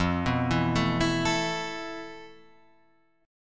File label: F Major 7th Flat 5th, F, down, Guitar, Drop D